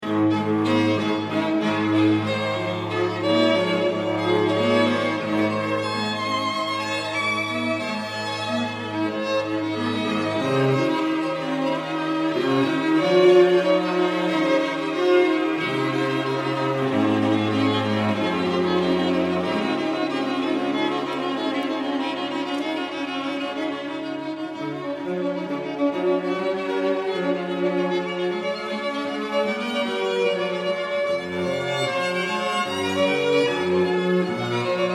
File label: String Quartet; Movement I